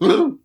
hit

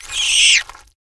insect die; Insects

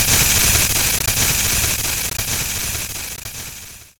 static; Five Nights at Freddy's The First Generation

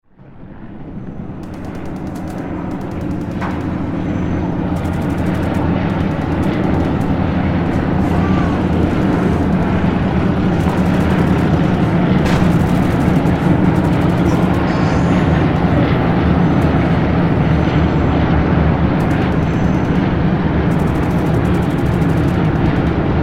SFX Planes; a dump in the dark

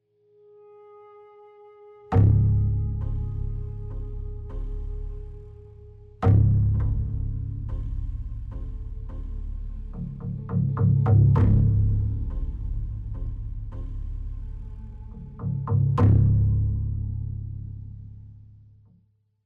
Tension Mood; The Last of Us

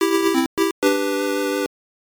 Fanfare; Crystal Warriors; Game Gear

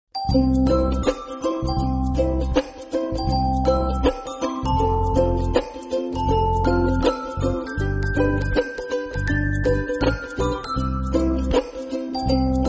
Jingle Bells (ukulele)

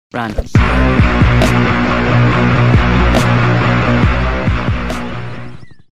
Bomb Run Music

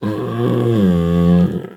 whimper

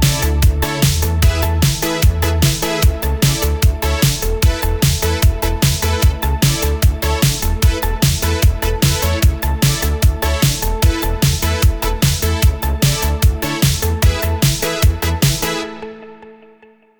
Invincibility Music; DreamFiend; jam2; Super Indie Karts

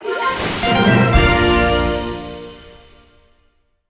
good job jingle; A Hat in Time

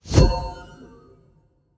powerup space; Golf Blitz 1.12

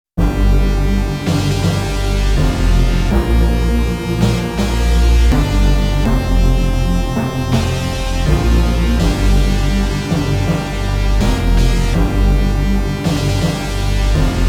All Clear; Golden Axe

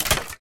Crate; friday the 13th killer puzzle

